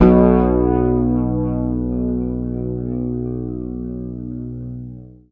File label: B, Bass; Sampleheads; Will Lee Bass Library